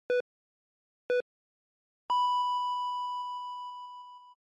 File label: Time Signal Beep Mid